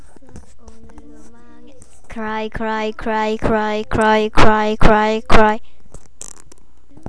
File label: cry